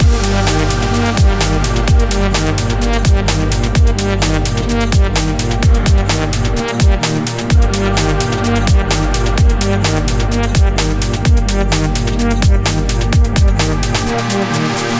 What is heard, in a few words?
cyber tech loop